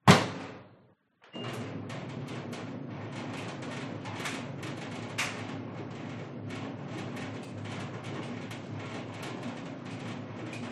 household tumble dryer door close run metal rattle operate